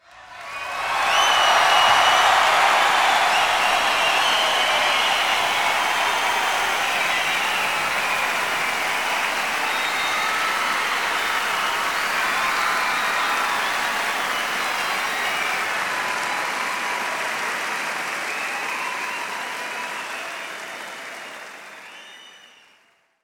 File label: Crowd Effects